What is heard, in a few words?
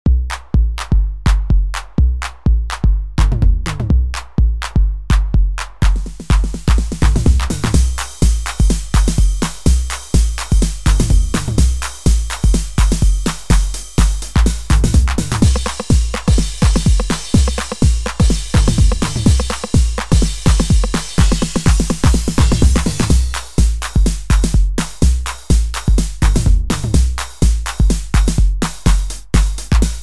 melody, 909